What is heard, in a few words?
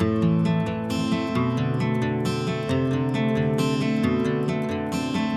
guitar looped, Sad; Loops